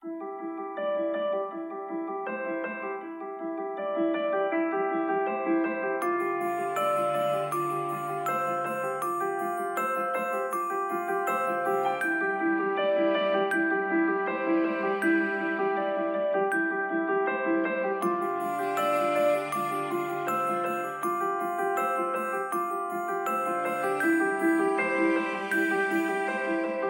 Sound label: zvuki telefona black shark